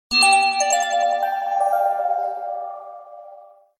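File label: bells sms message on htc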